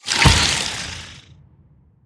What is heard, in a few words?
spitter death big, creatures